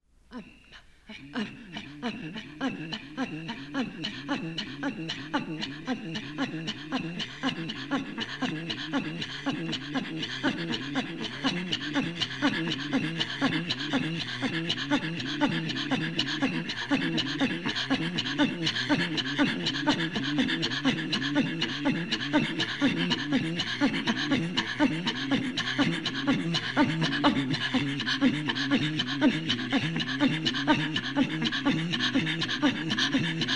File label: Inuit Throat Singing